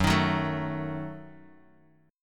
F sharp Minor 6th F sharp Guitar Open D

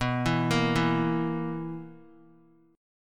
B Major 7th Suspended 4th B; BM7sus4; arpeggiated

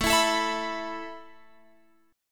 A Suspended 2nd, down, Guitar, Open F; Asus2; strummed

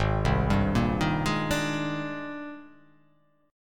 G sharp Major Sharp 11th, chord

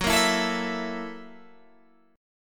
F sharp 7th Sharp 9th, Guitar, Open G

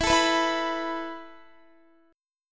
E Suspended 4th, Guitar; strummed; Esus4; 4E,4A,5D,5G,5C,6F

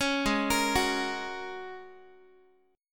G sharp 9th Suspended 4th, Guitar; arpeggiated; chord; 16,16,13,16,x,x

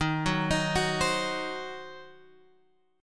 D sharp Minor 6th D sharp down Guitar Open G

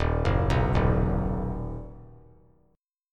D sharp Minor Sharp 5th D sharp down; arpeggiated; D#m#5; JGuitar

